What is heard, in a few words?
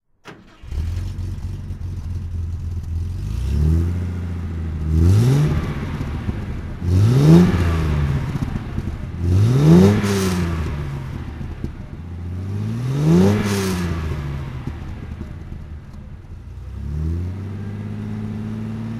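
mercedes benz 300sl gullwing throttle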